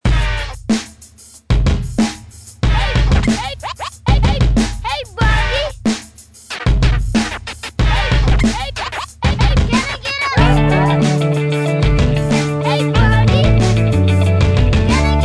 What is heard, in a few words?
Anthem; animal jam classic ost linux macos windows gamerip 2010; Jammer